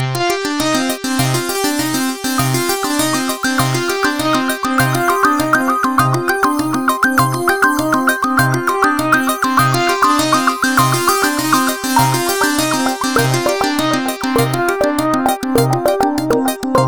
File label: Ringtone Spiral; Acer Liquid E1